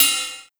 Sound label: Ride Bell Short